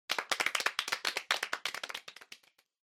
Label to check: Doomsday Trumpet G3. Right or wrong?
Wrong